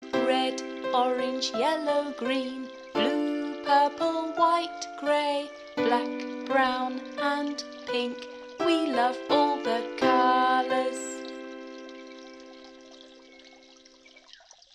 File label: Song Colour